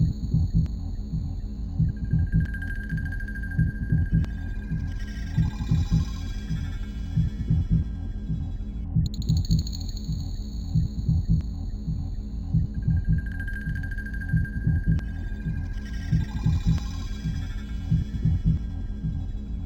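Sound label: machine tool low muffled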